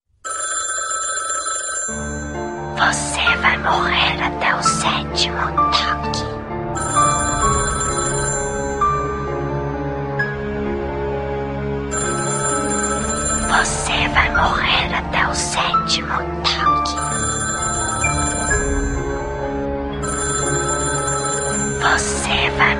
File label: ringtone